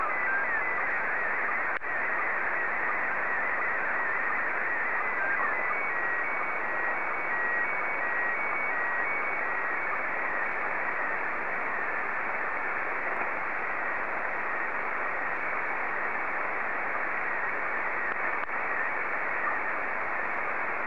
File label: SSTV, PD90